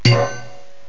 tin can

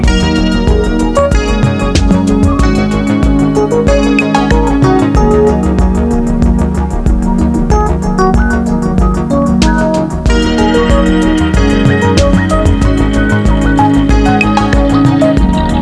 Music

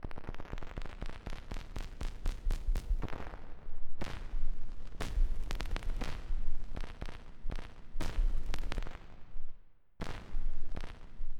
Vinyl; Onj's Soundfont

